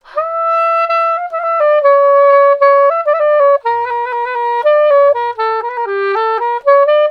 SAX, Balkanish Traditions

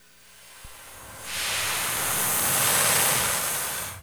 NOISE, Production Effects

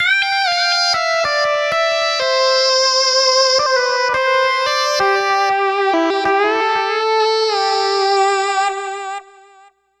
80s Lead; Riffs & Solos; Dr. Fink's Funk Factory

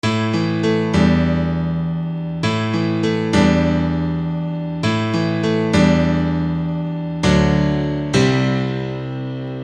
Acoustic Piano

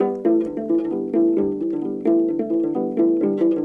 HRP AF HRP, Afro Harp Phr

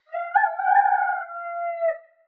Chickens; outdoor

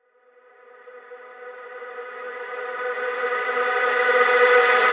Reverse Vocals G#min; Vengeance - Essential Clubsounds Vol.4; Effects

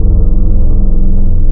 FAIRLIGHT SOFT, wavetables, Classic Synths

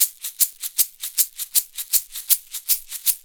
Roots Reggae; Sounds Good - Roots Reggae

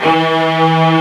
STRINGS D3; AKAI S-Series CD-ROM Sound Library VOL-2

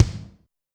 kick, Zoom MRT-3B

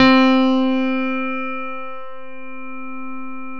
CP-70 C3; E-MU Formula 4000 Series Vol. 5; Protozoa

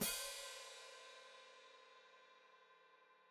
Hat Closed Open, Hi-Hat

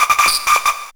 MORSE160, Hard & Loud Techno

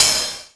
CYMBAL, Electronic; CYMBAL P2

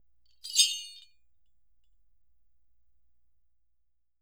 Btree, BELLS; Roland MV8800

